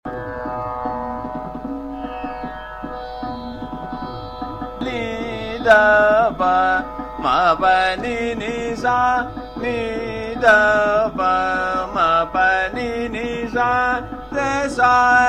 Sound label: Sargam, Ni Dha Pa Ma Pa Ni Ni Sa, Ektaal Drut; Soor Malhar